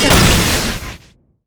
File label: grenade; rumble skin03